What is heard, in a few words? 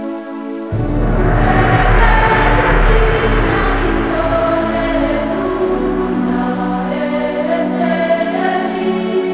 Song; Kinga 2.0